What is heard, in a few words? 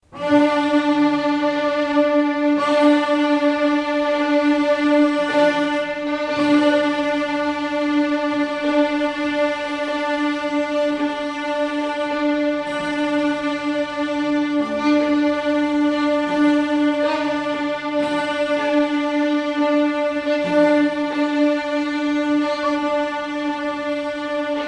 music for strings